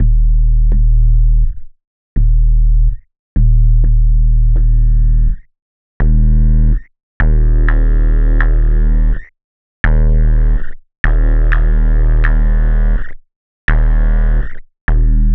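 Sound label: Classic Sub, bass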